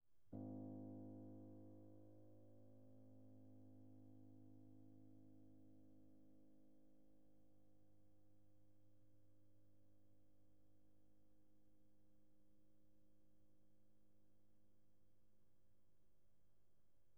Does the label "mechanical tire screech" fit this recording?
No